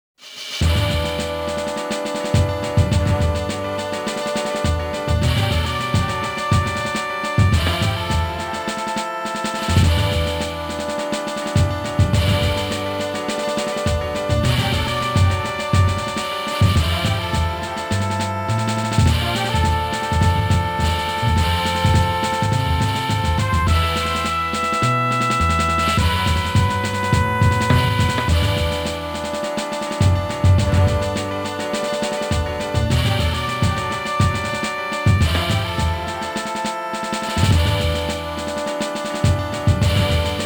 Score Ranking; 19XX: The War Against Destiny

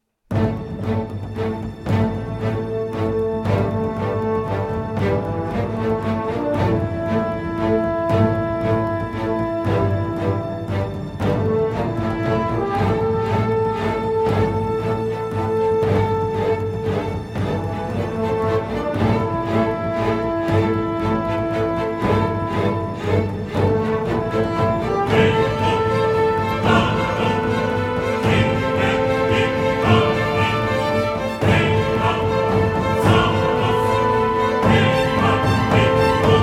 Battle Hymn; anno 1404 dawn of discovery

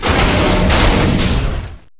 break door Heavy

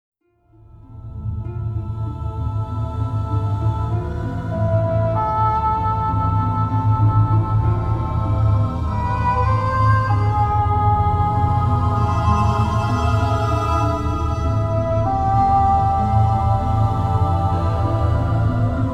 Dripstone Cave; dragon s dogma original soundtrack

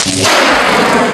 sfx multi; Playman Extreme Running

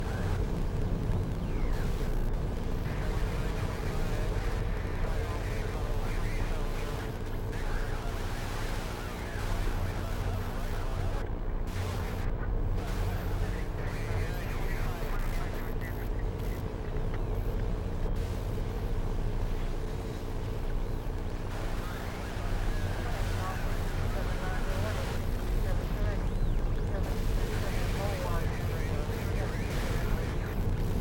Ambience Music; Five Nights at Freddys; FNAF Special Delivery; android ios

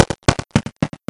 Step; hydra castle labyrinth gamerip 2011

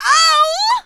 Female Mad Scream

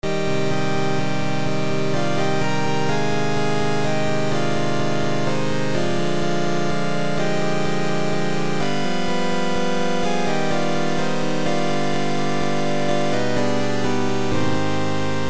Chiptune; momodora reverie under the moonlight

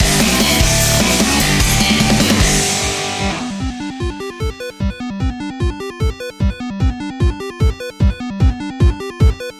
Victory, Jingle; big boy boxing demo ost 2023

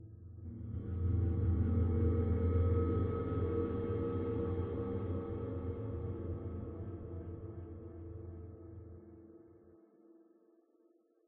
Npc Combat Echoing Pad

